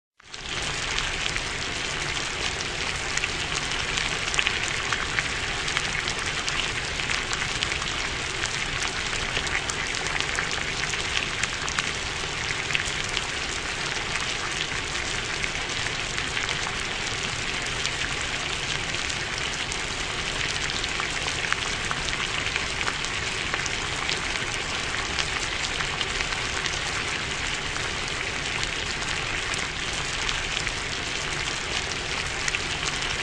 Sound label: Cooking Crack; DJ Sound Effects Live